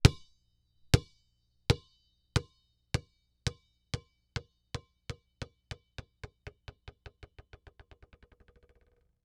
Sports Basketball Drop And Bounce Until Stop On Cement